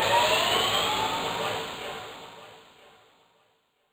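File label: Industrial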